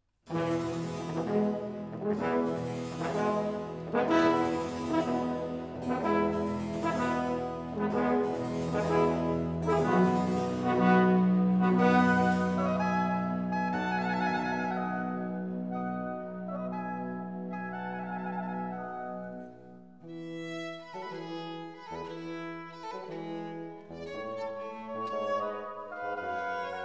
Concerto in Fa maggiore, Largo; Antonio Vivaldi (1678 - 1741); Vivaldi - Concerti e Cantate da Camera (2002)

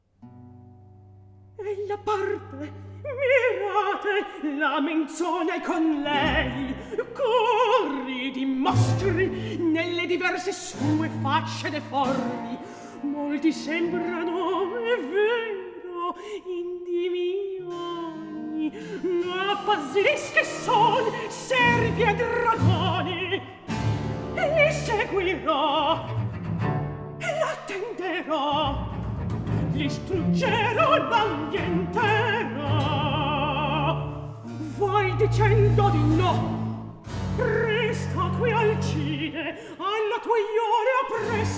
Recitativo; Atto III; Scena 6; (Orlando, Alcina, Bradamante, Ruggiero)

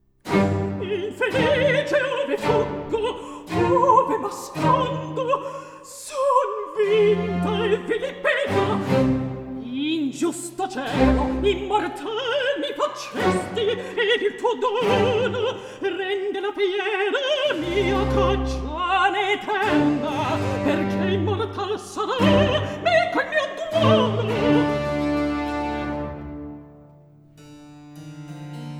Recitativo; Alcina, Orlando, Bradamante, Ruggiero; Antonio Vivaldi (1678 - 1741); Vivaldi - Orlando furioso (2004); Atto III

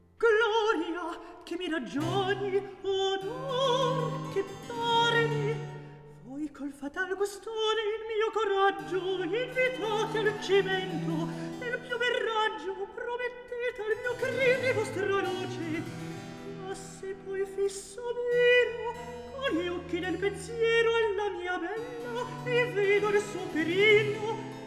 Recitativo; Atto III; Scena 7; (Ruggiero, Medoro)

